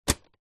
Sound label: electronic typewriter ink ribbon access door close brother ax 450; office